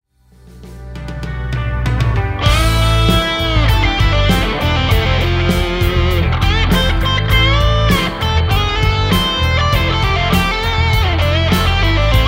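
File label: Stratocaster; igniteamps emissary v1 00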